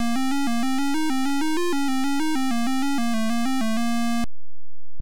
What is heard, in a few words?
Title, Beeper; Alien 8